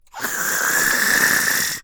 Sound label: Monster Roar Growl; Screams Shouts 2; soundbits